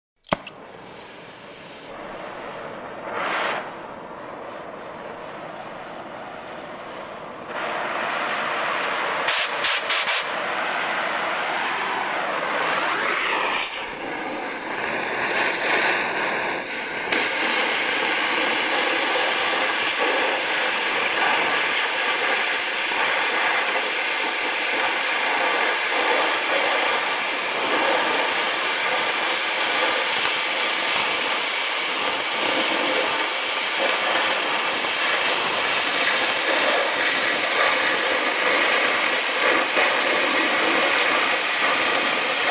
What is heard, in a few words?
Mist Dust Sprayer in action